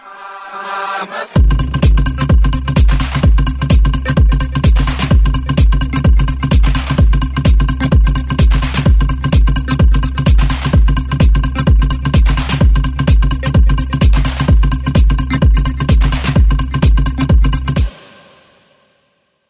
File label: Samba